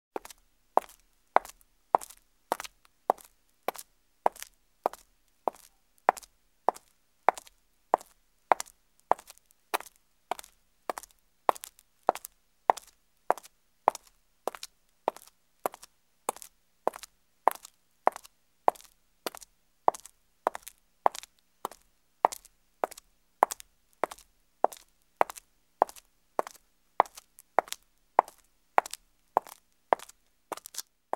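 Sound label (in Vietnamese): âm thanh tiếng giày cao gót đi trên bê tông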